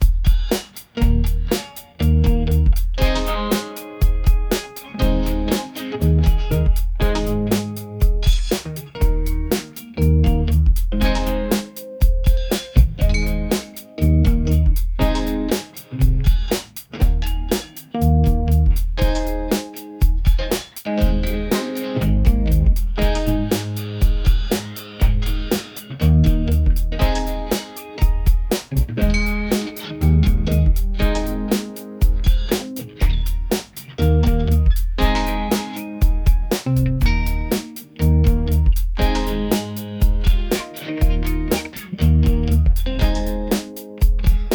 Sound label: funky groove